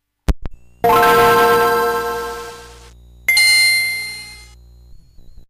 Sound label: Gameboy Advance Turnon Sound